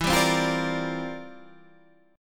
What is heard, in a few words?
C 11th E